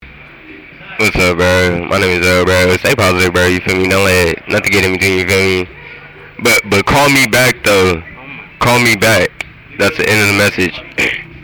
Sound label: Mumble Rap